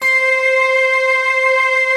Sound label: HARPS, Harpsiflute, Slow Harpsi Flute; Optical Media International; Sonic Images Library